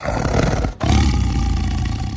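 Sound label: Tiger Growl Low Breath; big cats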